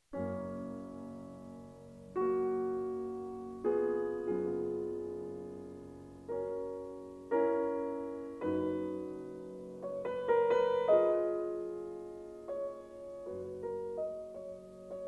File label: Piano Sonata 24 Op78 Fis dur; Ludwig van Beethoven; For Theresa; Wilhelm Kempff 1965